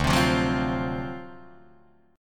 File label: D 7th Sharp 9th D down Guitar Open D